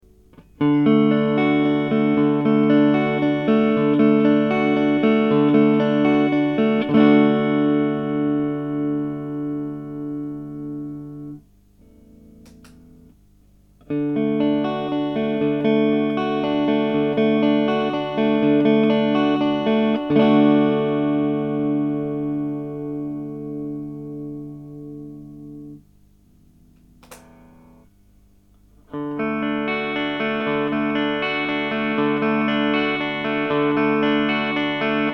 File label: alpegio